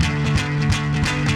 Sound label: boooogiee, guitars; BOOGIE; Loopasonic